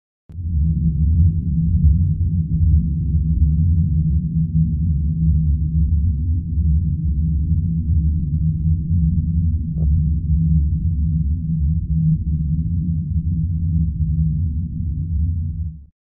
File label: the hum